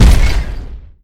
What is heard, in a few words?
step; gundyr